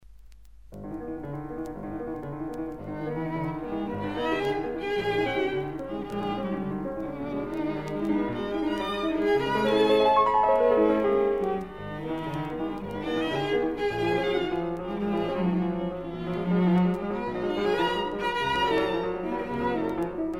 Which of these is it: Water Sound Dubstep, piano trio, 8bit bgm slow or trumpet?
piano trio